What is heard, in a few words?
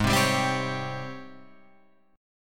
G sharp Major 11th G sharp, Guitar Open F; JGuitar